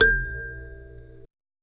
kalimba mbira thumb piano

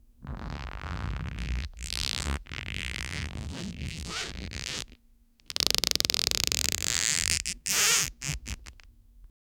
rubber stretching slime

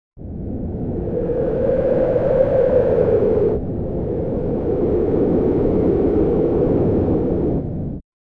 distorted sound of the vo, void

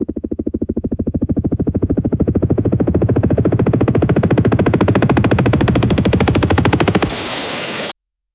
fast propellers slow down, helicopter

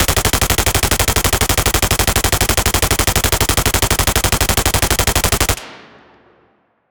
a clean scifi machine gun

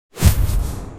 big smooth explosion, shimmering particles

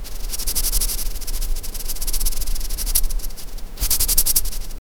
faint stitching sounds, textiles

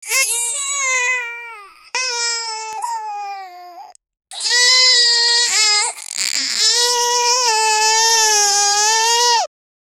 emotional baby crying

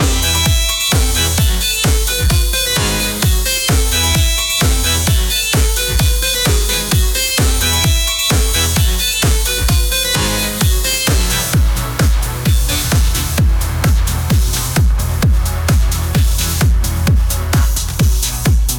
disco

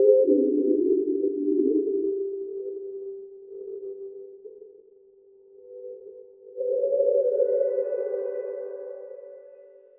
spa

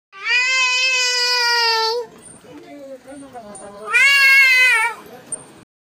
baby elephant in market

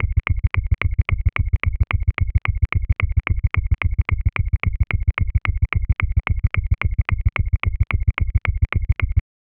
loop 110 bpm rhythmic

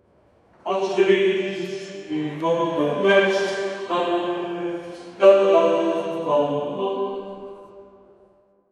in the church

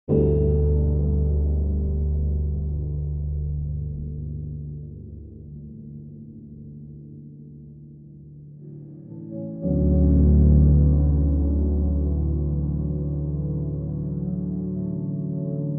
dark ambient soft piano

